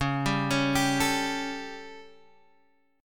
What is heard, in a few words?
A 7th C sharp, chord